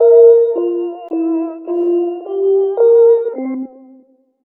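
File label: extra lead (spooky); Sonic Foundry (Sony Creative Software); Ugly Remnants VOL-2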